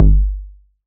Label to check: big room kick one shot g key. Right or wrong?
Right